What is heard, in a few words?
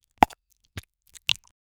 a fish chomp